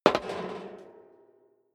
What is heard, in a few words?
step high heels metal rattle single right; schritte